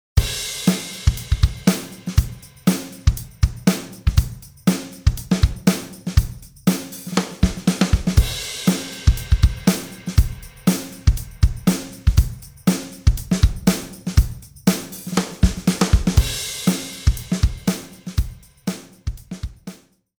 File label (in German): Drumbeat erst pur dann Oxide auf Einzelspuren